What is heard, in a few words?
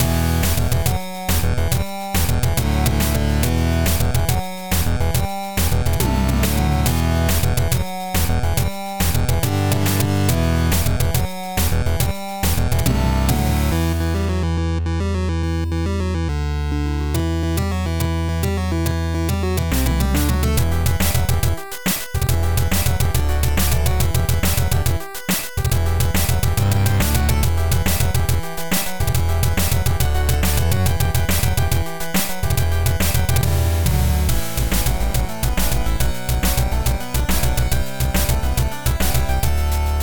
Boss Battle